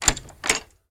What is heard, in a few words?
Stable door wood open; the 39 steps